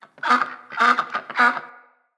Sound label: Trapped Duck Call; Encounters; Animal Rescue